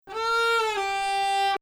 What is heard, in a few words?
Bayati Samai Nahawand, maqam